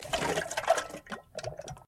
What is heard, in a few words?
Oil spill